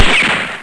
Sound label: Gun Shot; Smile!